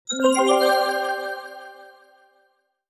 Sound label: shine